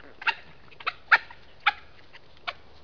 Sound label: yelps